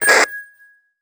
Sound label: battlesuit